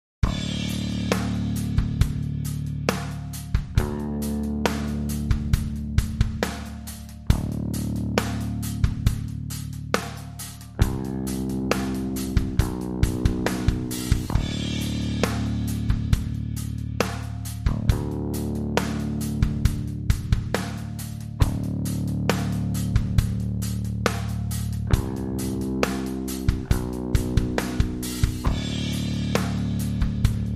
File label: drums bass